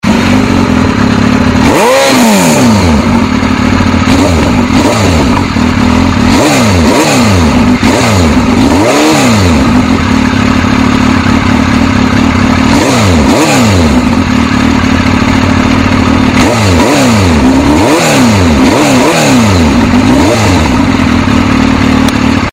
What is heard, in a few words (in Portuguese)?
Ronco de Moto